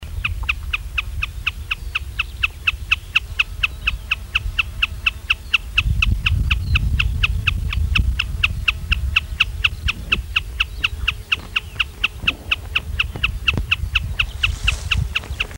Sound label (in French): becassine des marais, chant